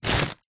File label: packet spinach air inside pick up; foley; zapsplat